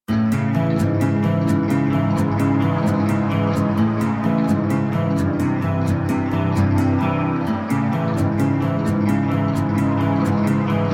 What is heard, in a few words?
instrumental